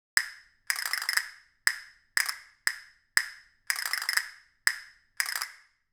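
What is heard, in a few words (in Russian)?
Kastanety, castanets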